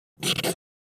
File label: Scribble signature on paperwork